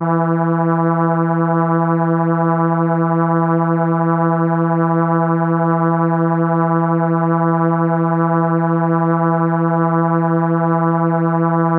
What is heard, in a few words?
Horn E4, ARP Solina